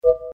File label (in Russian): Звук звукового сигнала по умолчанию